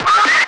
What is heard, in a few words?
squelch